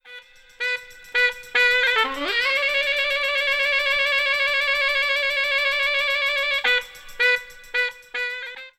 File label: Saxophone lick